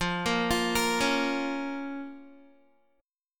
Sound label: F Suspended 4th Sharp 5th F, Guitar; Fsus4#5; arpeggiated